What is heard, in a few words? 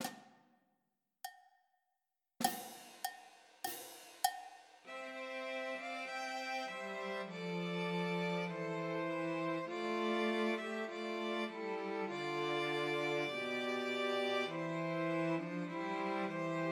Christmas Trios for Two Violins and Cello; In the Bleak Midwinter